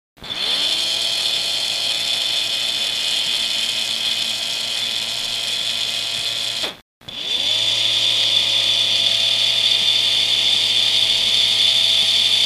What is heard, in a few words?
Stab HH120 clean, motors